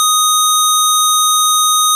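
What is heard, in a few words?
string D#3; fleet keys Samples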